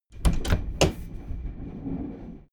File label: train intercity train door open